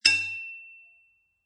Hit Metal Pipe, Metal pipe impact, hit metal on metal, clank, dink; Metal Sound Effects; Metal (Sound Effects:Materials)